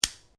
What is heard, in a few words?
Branches Dead Snap; Material components for constructing more complex sounds; Snaps (Sound Effects:Objects)